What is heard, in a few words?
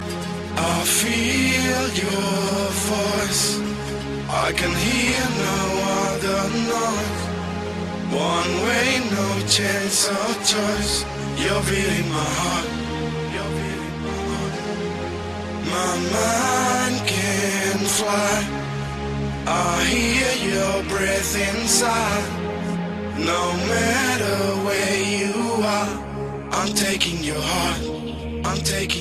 Mans Vocal